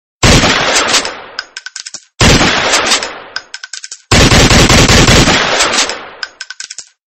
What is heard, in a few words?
9 Mm